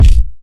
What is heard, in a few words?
boom kick drum a sharp key; kick drum samples